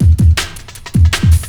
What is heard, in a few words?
break